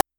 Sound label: ticks